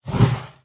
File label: Nature Cast